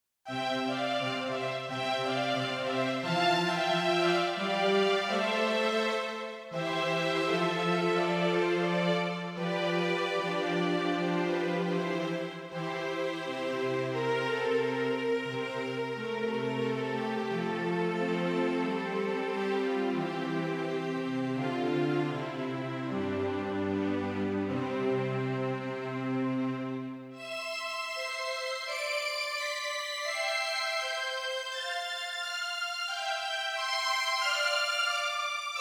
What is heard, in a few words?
Strings; ada8000